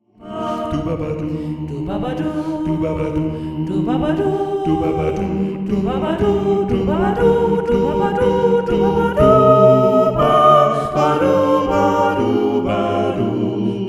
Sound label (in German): marsch